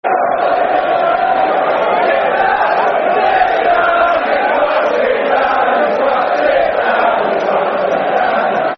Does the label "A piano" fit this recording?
No